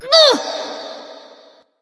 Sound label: grunt hit light; smite; voicepack; hel